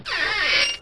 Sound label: door move; scmod; doors